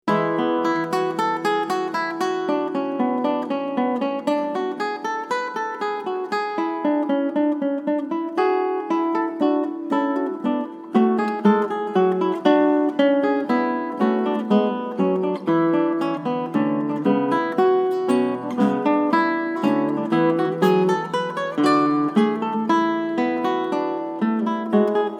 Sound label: Lullaby Fantasy